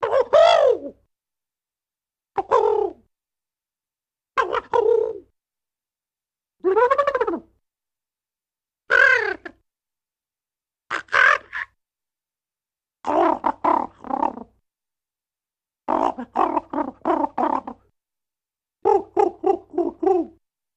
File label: Various Crow Caws Un; birds